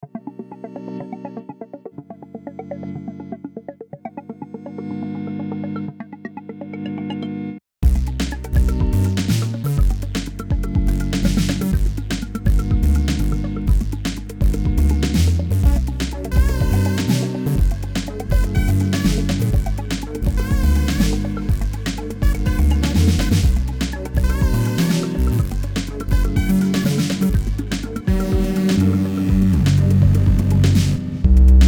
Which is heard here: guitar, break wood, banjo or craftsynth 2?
craftsynth 2